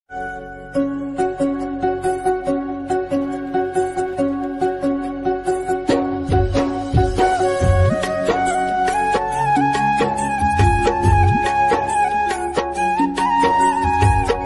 Krishna ringtone flute ringtone sad ringtone bhakti ringtone